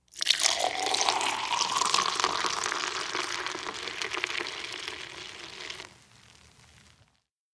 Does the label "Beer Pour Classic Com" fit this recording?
Yes